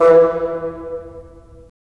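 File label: WND BN, wind bassoon; LA Composer vol. 3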